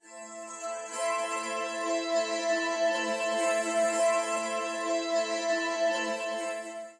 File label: Alien Minstrals C4, pads and strings